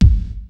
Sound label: PHAT TRAX KIT, DRUMS; Kid Nepro; Elite